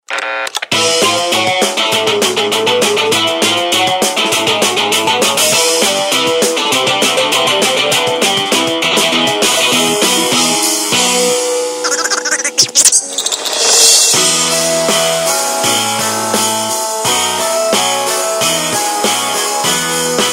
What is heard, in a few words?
Die Fledermaus